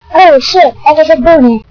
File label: alert